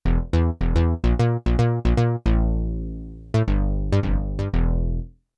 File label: Syn Bass2 C1-C3, Yamaha TX16W; Combo Performance 1